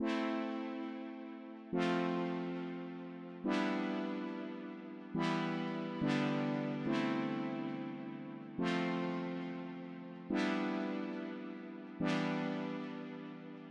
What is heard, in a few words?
70 E Stay Chords